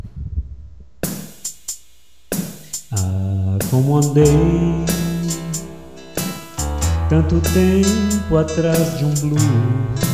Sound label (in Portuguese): a voz do blues